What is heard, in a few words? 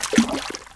splash, footsteps